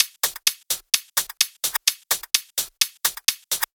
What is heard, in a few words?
Loop, 128 BPM